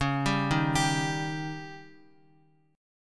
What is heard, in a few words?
Db Suspended 2nd Flat 5th Db down Guitar; arpeggiated; JGuitar